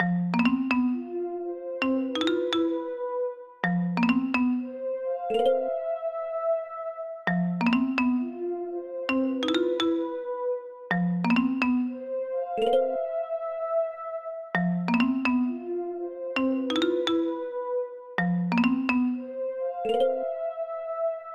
BOSS MID TALK

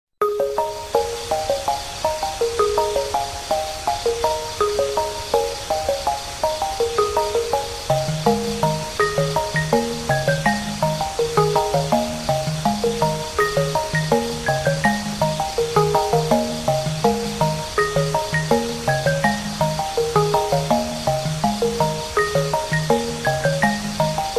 Peaceful Mushroom Village

